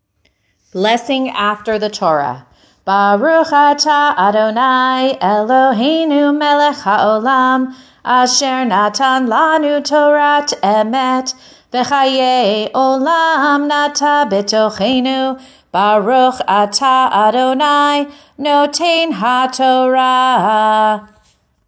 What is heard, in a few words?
Blessing after Torah; bnai mitzvah; barbat mitzvah